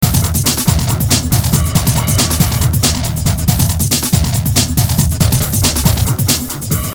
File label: depressing rock music